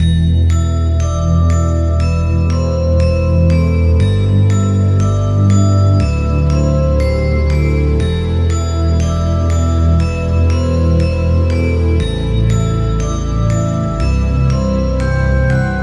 Mysterious Bells Loop; Tax Fugitive

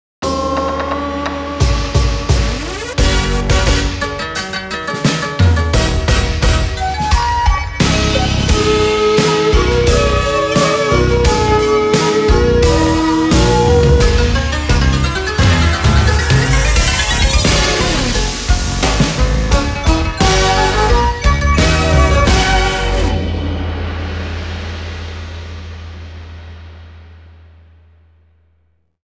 Openning BGM; ps2; Taiko no Tatsujin 11

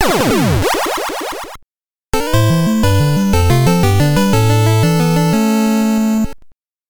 Game Over BGM; konami famicom chronicle vol.3 rom cassette edition

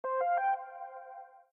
Ok Sound

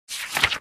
Page turn; bad piggies; android; mobile; windows

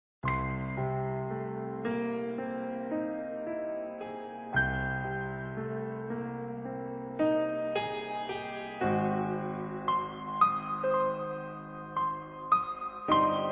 Mystery Ambiance (Piano); Alice: Madness Returns unreleased original soundtrack

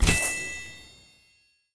Menu Button Spend Gem; Angry Birds

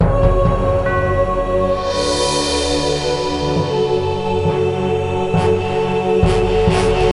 Pirate Defeat; Lego Battles 2009 NDS

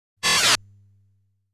Boss Fires a Lazer

